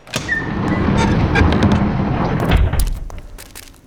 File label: Squeaky Door